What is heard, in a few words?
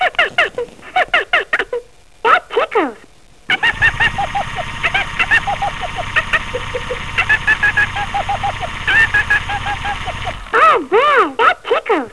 Tickle